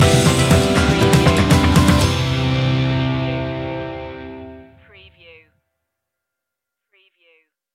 a warm welcome stinger

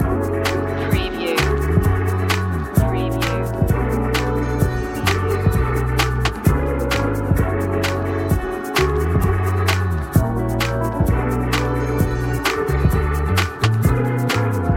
falling in love loop

